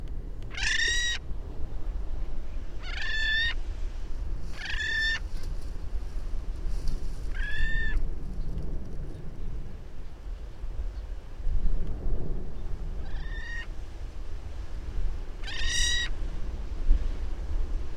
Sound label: Magnificent Frigatebird Fregata magnificens